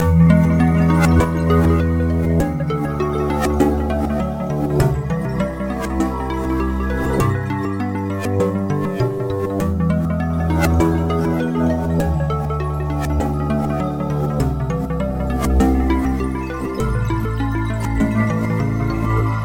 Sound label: 3D, ringtones; SKY IM-A770K VEGA Racer